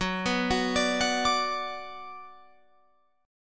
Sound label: Gb Suspended 4th Sharp 5th, Guitar, Open F; arpeggiated